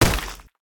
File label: footstep; smite; raijin